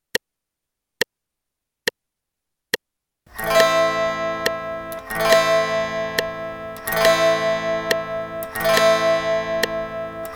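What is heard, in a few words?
strum quarter notes pattern 2 Am, guitar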